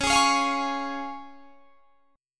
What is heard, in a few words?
C sharp Major; strummed; 21,23,23,22,21,21